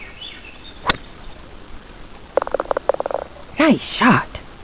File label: Golf Windows Start; Microsoft